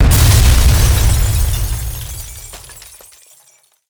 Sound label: Explo Large Glass; Destruction